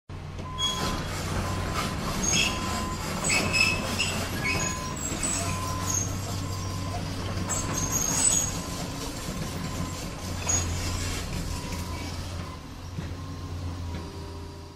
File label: Drilling rig